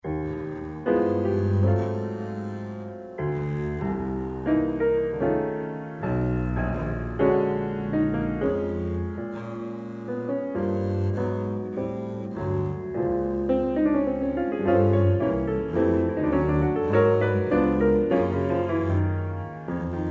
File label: jazz